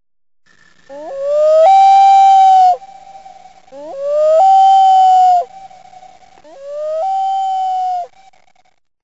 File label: wail